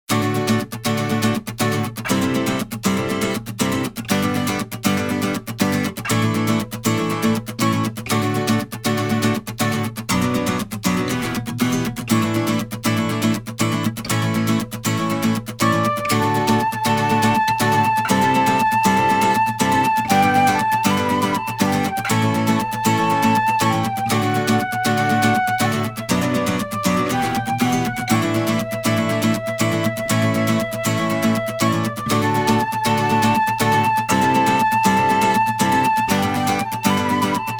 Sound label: INSTR